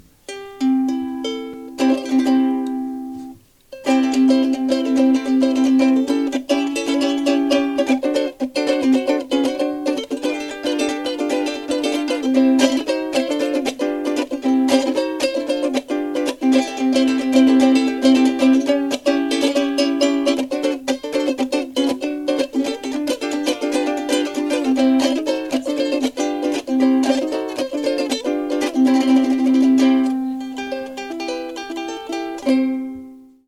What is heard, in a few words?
soprano gloss nylgut, ukulele